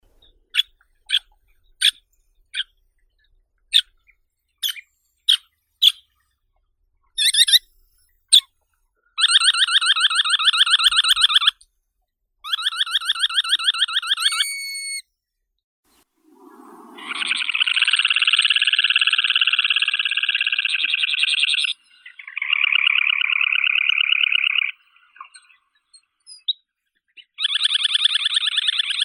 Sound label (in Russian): pesochnik malii calidris pusillus